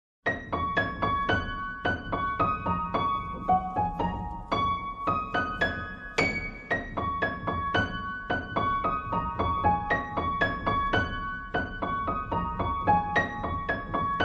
Pianos Only

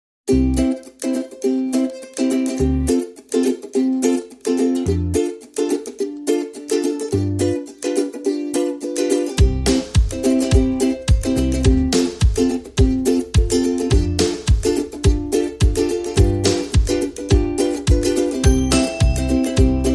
No Claps No Accordion

